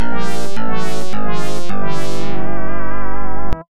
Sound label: Failure!